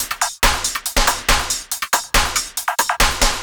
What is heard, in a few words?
Electronic Percussion